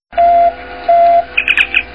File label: accord roll